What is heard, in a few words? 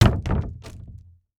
Wire Bunched Hitting Hollow Wood; AdobeCC